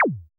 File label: JUNO HIQ, TECHNO; Drums In Your FACE!